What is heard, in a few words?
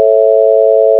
a c sharp and e